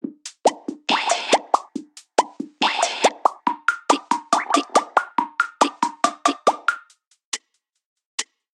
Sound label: ringer bounce, ringtones; Orange Sanza